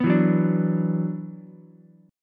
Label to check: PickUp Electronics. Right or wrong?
Wrong